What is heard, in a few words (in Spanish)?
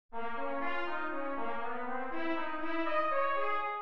Alt inv0 en C; Carnavalito